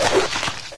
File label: hc attack, headcrab; mistake coop